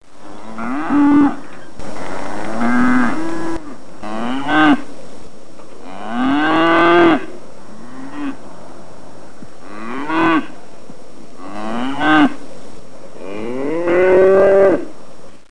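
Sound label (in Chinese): niu jiaosheng, cow mooing; jiachu jiaosheng; jiaqing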